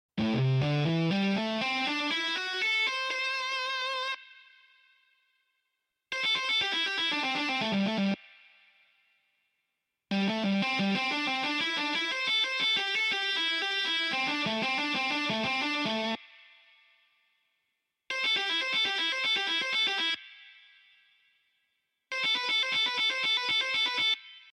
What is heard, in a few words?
Hammer On Pull Off Licks; solo techniken